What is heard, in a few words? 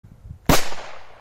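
vystrel, shot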